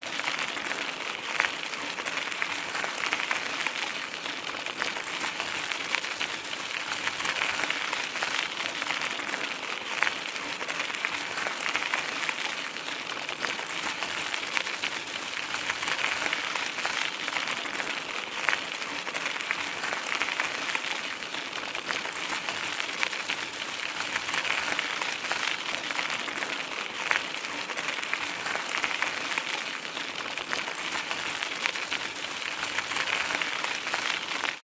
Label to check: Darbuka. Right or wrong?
Wrong